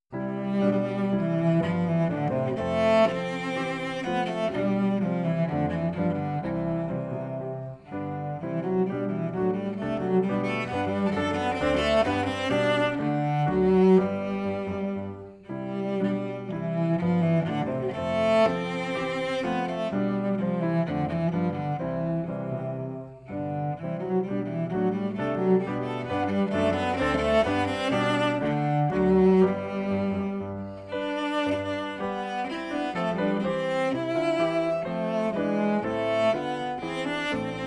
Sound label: Bourree, cello; Suzuki Cello Vol 2